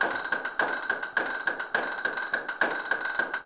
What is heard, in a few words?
CASTANETS, Flamenco; Best Service ProSamples vol.46; AKAI